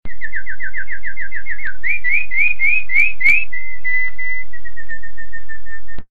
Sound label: bird yellow; minecraft; technic